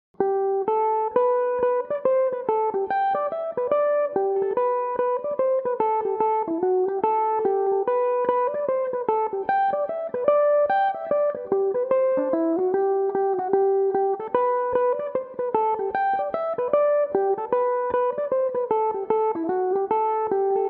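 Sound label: g major, mandolin; The Gilded Cage; Belmuse EM-190 electric mandolin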